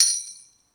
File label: Tamb Long; Lost Tapes From Mars